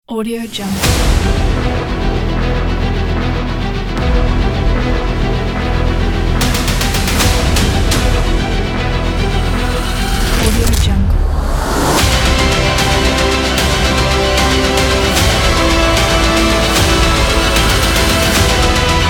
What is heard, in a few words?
inspiring epic adventure trailer